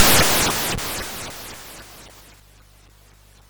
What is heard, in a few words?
Frantic, MOS6581R4